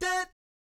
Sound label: "dat", Jazz Fx, F#4; Spectrasonics; Vocal Planet Vol.3 Jazz Fx